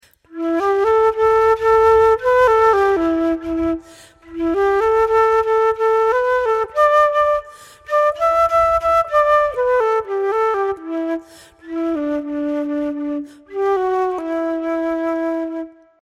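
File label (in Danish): fløjte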